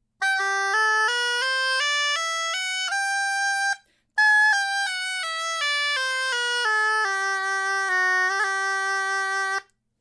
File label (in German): punteiro g tonleiter